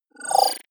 disappear flicker; soundpool

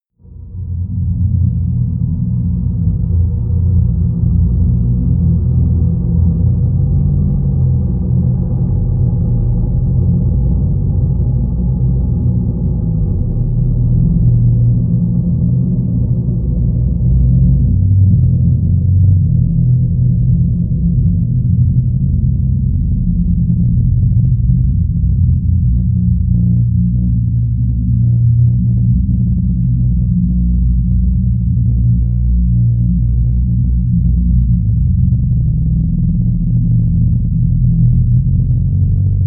drone just rumble

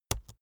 keyboard laptop enter press slow hard; kommunikation; computer

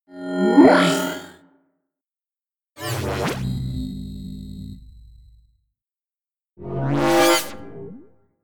beam

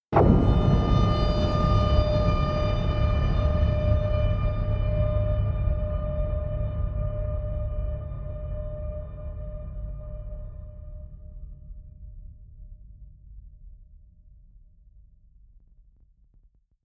sub explosion ring, impacts; hits explosionen

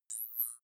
zoom in

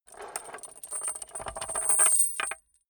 metal rattling on wood

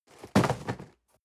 cardboard box medium fall high; objekte

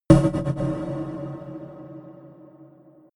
energized fuse; whooshes-rises; hits-impacts

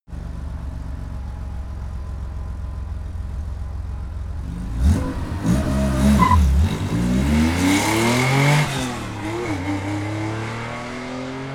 sports car porsche rs ext drive accelerate fast; verkehr transport; autos

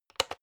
electric whisk turn on; haus-garten; kueche

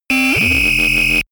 system overload; digital

